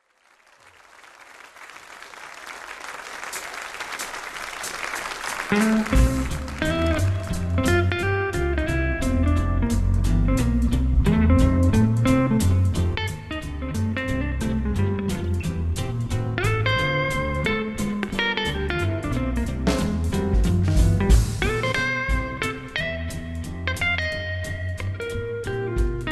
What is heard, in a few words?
blues